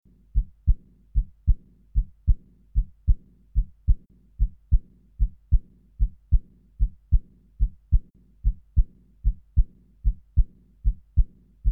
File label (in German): Aorteninsuffizienz und Aortenstenose, Heartbeat